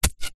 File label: foley wood post sharp pointed dig into soil